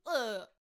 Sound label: Peasant Death Vocals